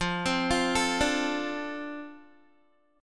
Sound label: F 6th F down Guitar; F6 arpeggiated